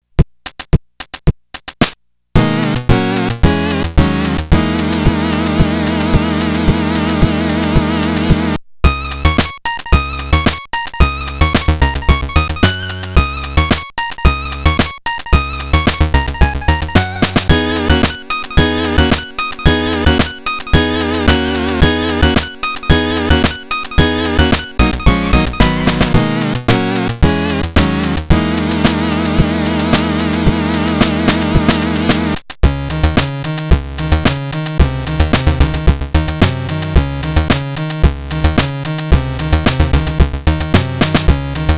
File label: Event C (Chiptune version); The Dark Spire Original Soundtrack 2023